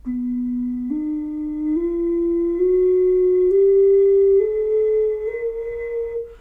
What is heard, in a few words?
okarina